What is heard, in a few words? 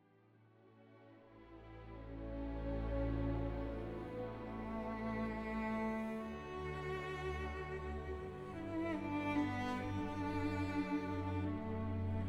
cello concerto 1st movement; Dvorak